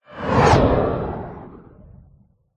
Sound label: science fiction space vehicle whoosh past